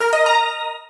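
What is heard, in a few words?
Matching Notification; MilkChoco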